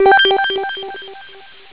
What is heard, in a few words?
chime